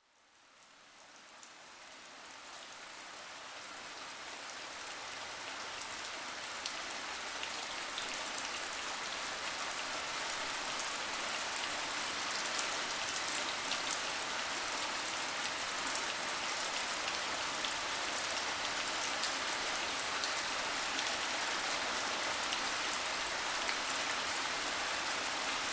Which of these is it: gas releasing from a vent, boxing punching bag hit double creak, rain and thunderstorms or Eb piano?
rain and thunderstorms